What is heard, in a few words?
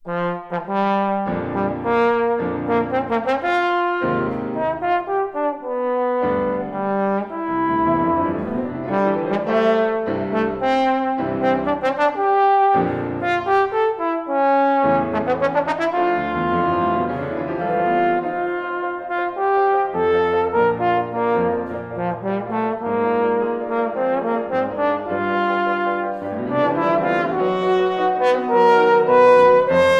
Sonata for Trombone and Piano, Allegro con brio